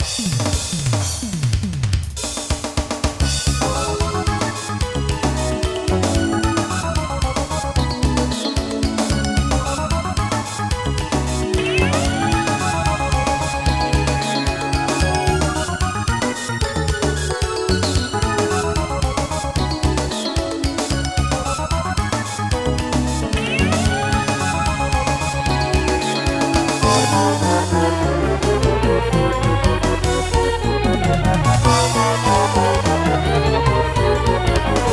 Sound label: roland m vs1